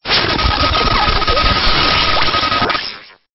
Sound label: Stinger; STINGERS